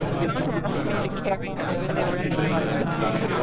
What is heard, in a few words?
bubble